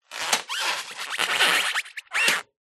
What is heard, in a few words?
vehicles bicycle wheel slow roll rub squeak concrete ground; zapsplat